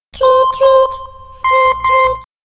Tweety-tweety

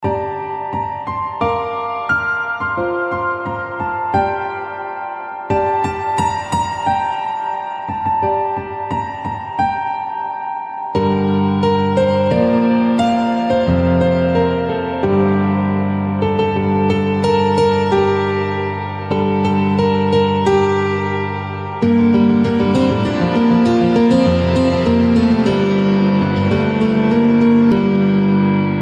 Piano

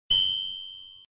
Microwave Sms

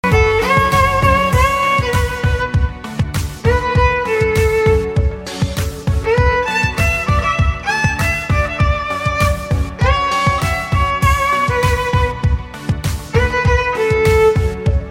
Violin

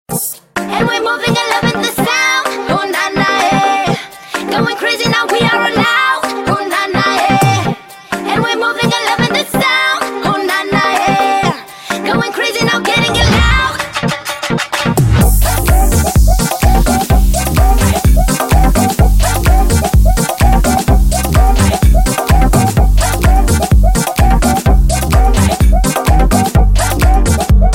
Narcotic Sound

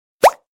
Simple Bubble Pop

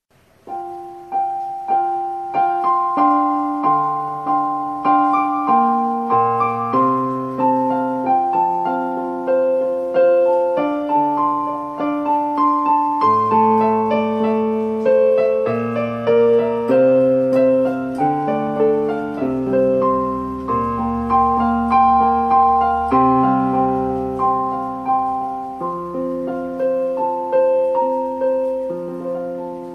Piano improvisation

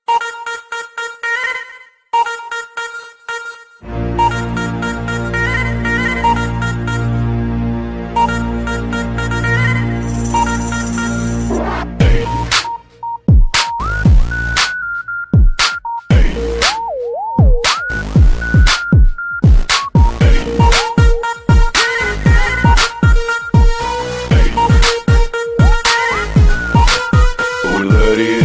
Hard Bass

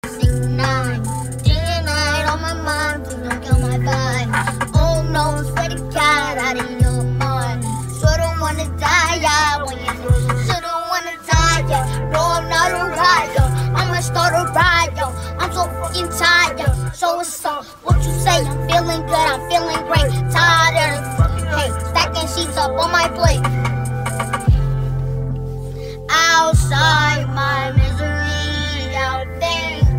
Kid singing

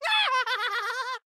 laugh; junkrat

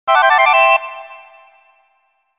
Grunty Note Question

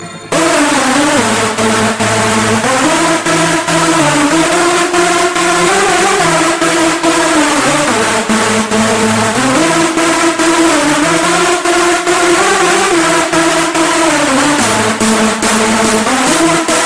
Bass

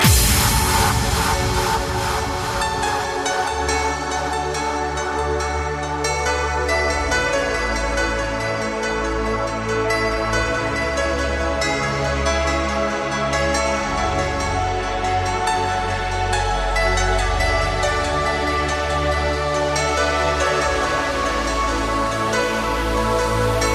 Trance

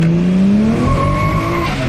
R33 turbo

